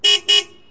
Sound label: truk horn, city life autos; Kaboom! Junior